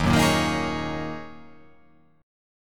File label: D sharp Minor 11th D sharp, chord